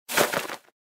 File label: takeoff